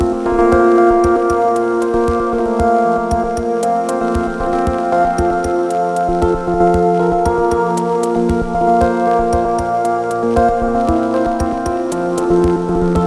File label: Lofi; ゆめ2っき